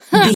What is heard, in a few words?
voice female effort jump action